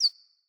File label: Cardinal Female Bird Single Chirp